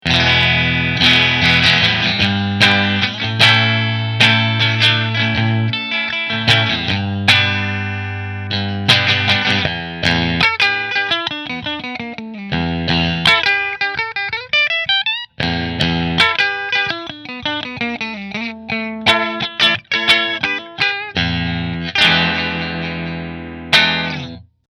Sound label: Fender Strat Kinman Position 1 Fender, guitars